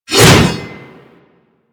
Axe Heavy Swing; Warframe; Weapons

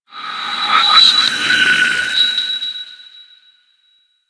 bark; Warframe; Teralyst; Vomvalyst

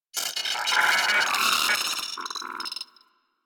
Arachnoid Micro Vocal Idle; Warframe; Corpus

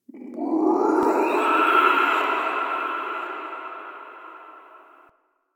Interior Texture Painful Screech; Warframe; Infested Eris; Ambience

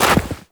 Run Deep Snow, Footsteps; Warframe; Operator

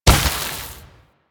Vial Corrosive Shatter; Warframe; DE; Warframes; Alchemist; Elements; Ability

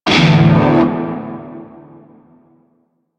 Shawzin Pent Maj Chord E; Music; Warframe; Narmer Shawzin

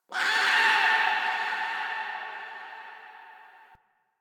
Interior Texture Painful Screech; Warframe; Infested Eris; Ambience

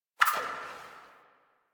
Omicrus Quick Draw Tail; Warframe; Quests; The New War; Weapons

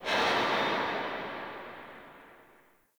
Alley Distant Mechanical; Ambience; Dungeons; Under City

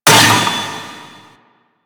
Vaults Stake Gate; Warframe; Entrati

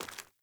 Sandals Walk Rock, Footsteps

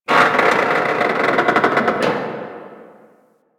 Metal Creak, ambience; One Offs; Warframe; Quests; The New War; Drifter Camp

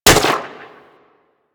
Speeder Rifle Fire

